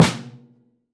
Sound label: Prepared Drums; Sonic Foundry (Sony Creative Software); The Electronic Music Manuscript Collection; Battery 3 Sample Kits